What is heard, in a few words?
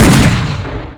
colt; ragemap2023